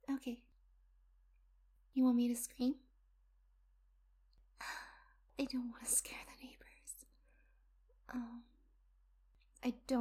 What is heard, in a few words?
Screams into Blanket, aahhhhhh